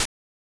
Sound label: Hi-Hat; Sonic 3; M8; Genesis